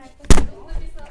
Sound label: bang on an apple television